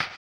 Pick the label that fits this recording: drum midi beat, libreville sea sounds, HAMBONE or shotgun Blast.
HAMBONE